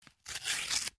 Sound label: music